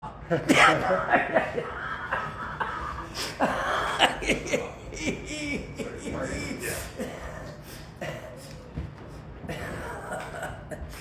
extended wheeze laugh

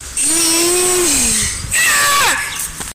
hii ehh

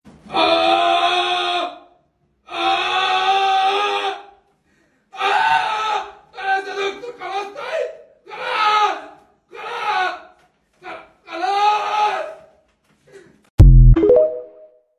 arabic man screaming

